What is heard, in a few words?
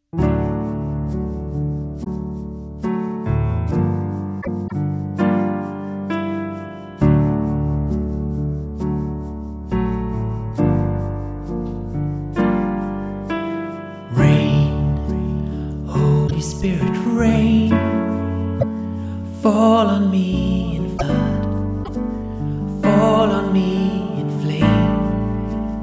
rain